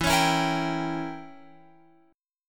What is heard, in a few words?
E Diminished 7th E, Guitar Open F; Edim7 strummed; JGuitar